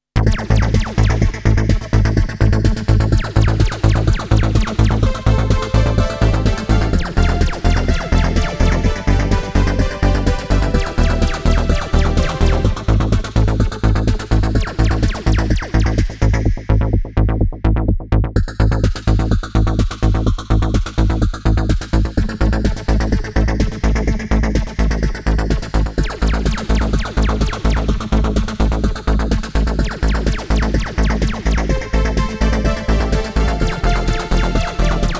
ARP, synthesizers; Club Seinajoki; Redshift 6

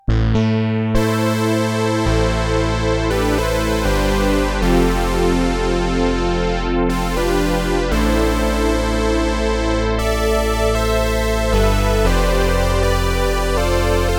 Multitimbral POLY BRASS SPLIT; Redshift 6

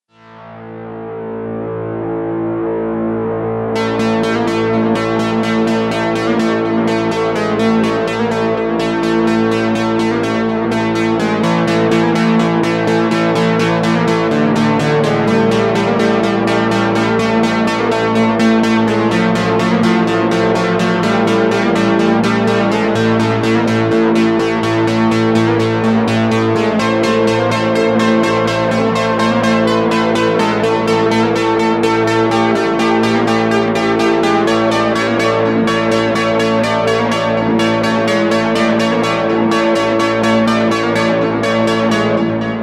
INDUSTRIAL GUITARS; Redshift 6

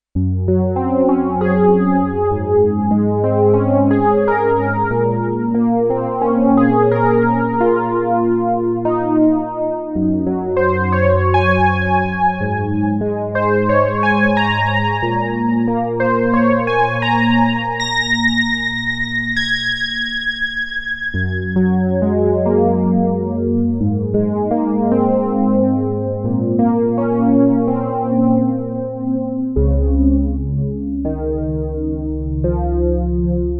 DCO CHORUS PIANO; Redshift 6

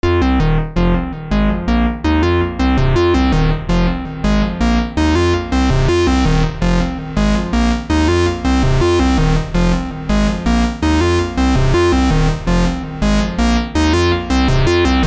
DELAY, synthesizers; Redshift 6

